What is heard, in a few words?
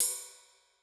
Ride, Cymbal, Yamaha DTXpress-II